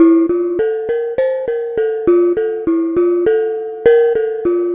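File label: Pelog Bonang Melody; Melodic; Ambient-Melodics-II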